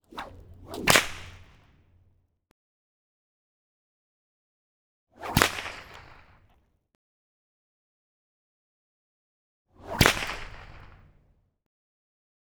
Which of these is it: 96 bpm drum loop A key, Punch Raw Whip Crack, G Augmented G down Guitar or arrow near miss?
Punch Raw Whip Crack